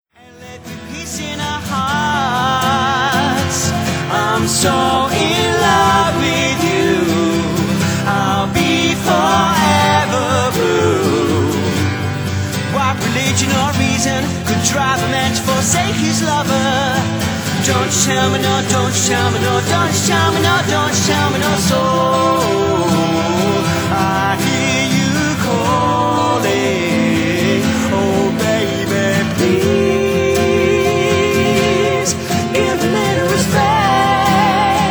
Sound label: Acoustic Session